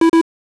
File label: double Beep